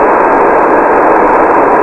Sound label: airplane cockpit, cartoon sfx